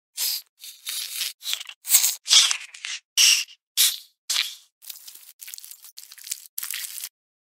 Silverfish, ماینکرافت

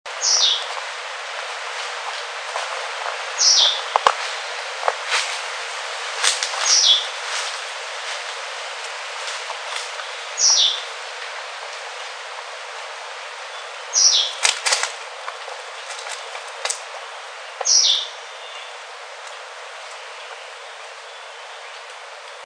burmese yuhina; پرنده یوهین برمهای

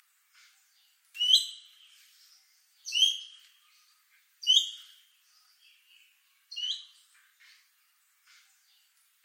Crested goshawk

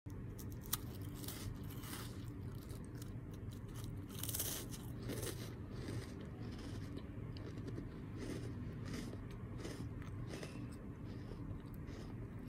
eating biscuits

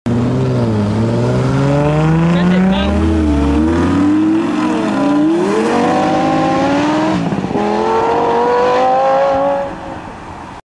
Lamborghini Gallardo

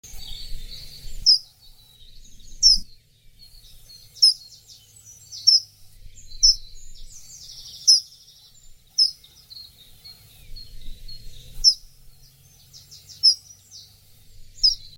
Accentors; صعوه

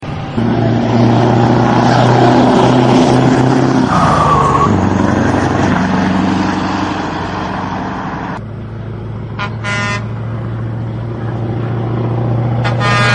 Scania V8 Big Sound

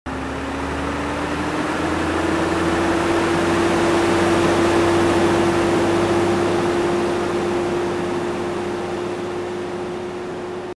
Renault Midlum 270 Truck; کامیون رنو میدلان ۲۷۰

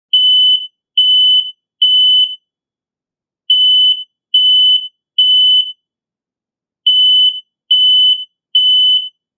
Fire alarm; آژیر اعلام حریق

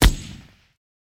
M60 Machine Gun

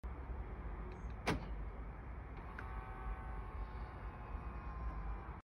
Electric car mirror; آینه برقی ماشین

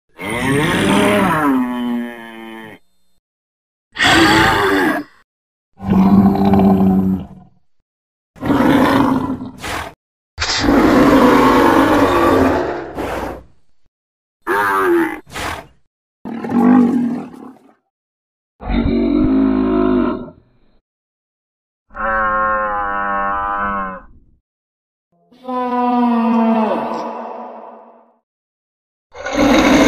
Kouprey; گاو کامبوجی

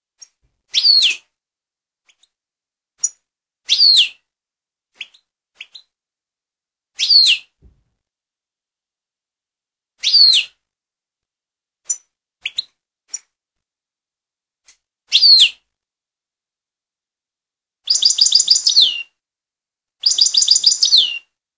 goldfinch

